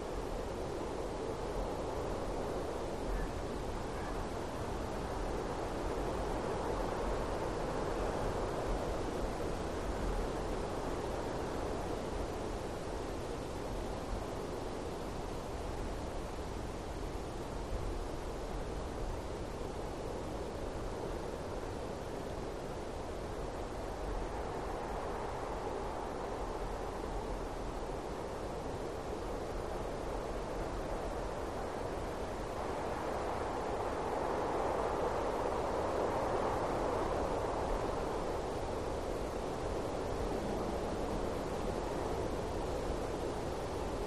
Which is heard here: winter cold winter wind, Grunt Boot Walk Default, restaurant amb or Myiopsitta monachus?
winter cold winter wind